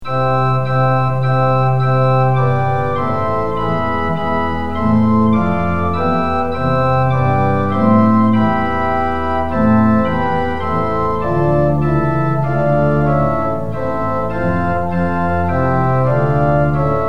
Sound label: tune; hymns; lillenas